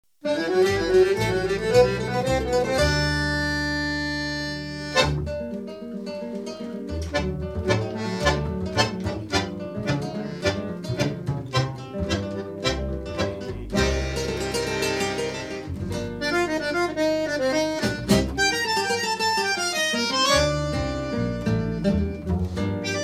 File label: tango